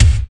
original kick drum single shot a sharp key, kick one shot tuned to the A# note